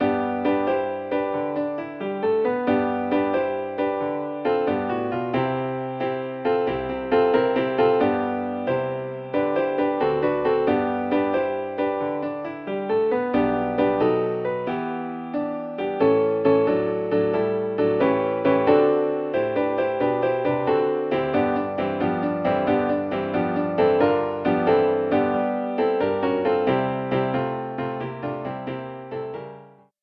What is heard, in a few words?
accomp; hymnary